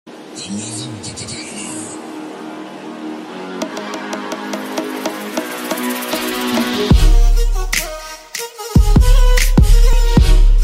arabic instrumental trap instrumental trap arabic trap arabic ringtone